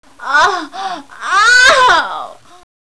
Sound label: ORGASM